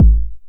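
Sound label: 909 BD tape, kick drum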